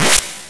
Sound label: confirm; Antimatter